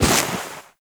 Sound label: Step Snow, Footsteps; Enemies; Foley; Warframe; Duviri; Dax